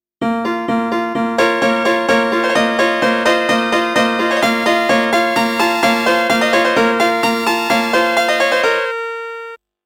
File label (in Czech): melodie